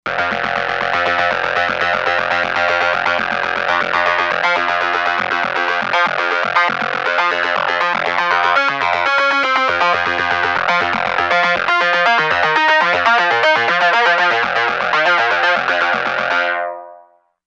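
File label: Lead LoFi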